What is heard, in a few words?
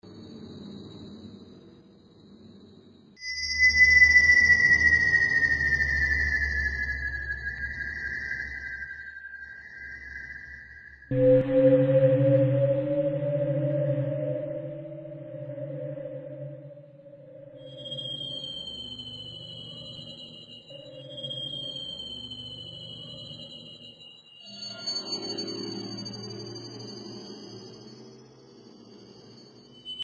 atmo scifi crystal harmonics